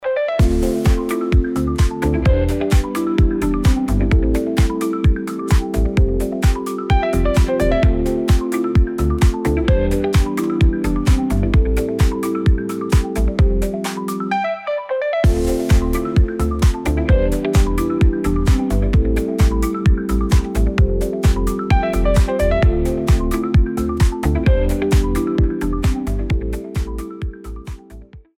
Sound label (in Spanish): Pop, Generado con I.A.